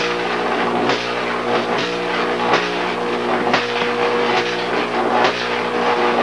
This is the sound of a 12kV 120mA modified Neon Sign Transformer (NST).